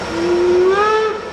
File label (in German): Pfiff